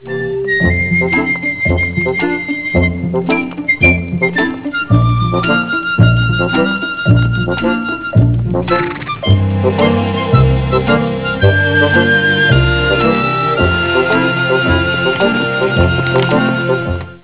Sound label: trail music; bradybunch